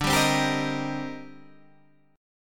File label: C Minor 7th D; Cm7/D strummed; JGuitar